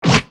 battle; maoudamashii

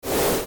retro; maoudamashii

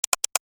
pc; maoudamashii

